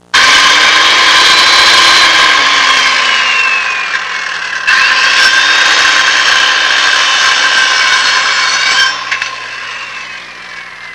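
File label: ELECTRIC SAW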